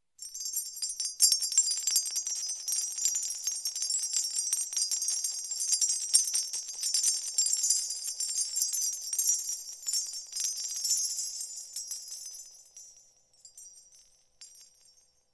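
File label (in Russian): бриллианты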